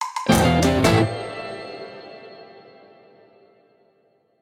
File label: Sting